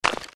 break

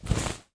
cloth Remove; spellbound

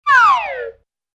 rainbow slide short; abcs; letter m

